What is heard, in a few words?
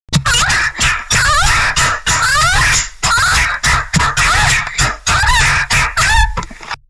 loud moans